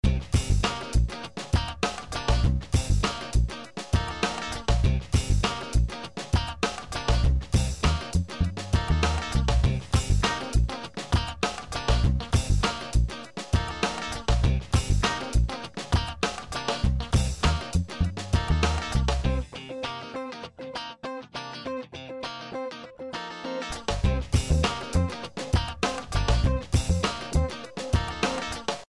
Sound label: bgm game; Helloween